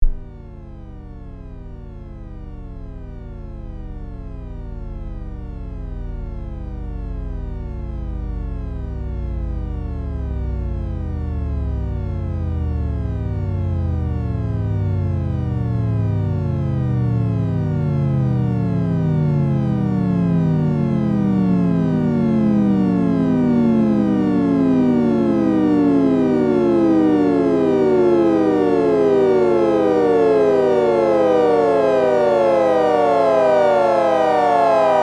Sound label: down up, Risset Rhythm